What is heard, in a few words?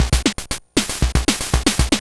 LSDJ Rock Rhythm 117BpM, Nintendo Gameboy; maurice.rebell Loops